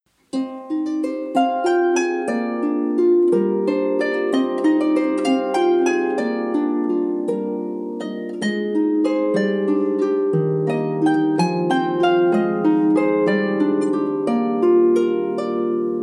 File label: Harpa